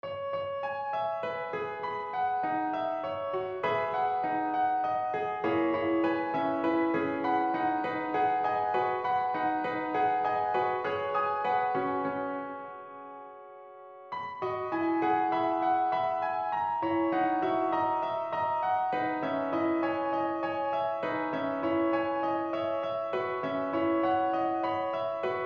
piano Preludes No. 3 in B

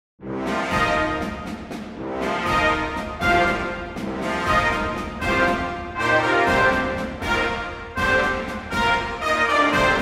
Fanfare

